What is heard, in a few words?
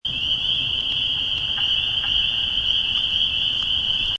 Crickets